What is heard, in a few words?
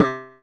ELEC BASS HI